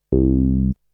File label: Synth; ZERO G - Datafile DISC 3